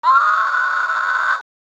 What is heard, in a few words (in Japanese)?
悲鳴